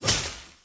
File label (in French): glace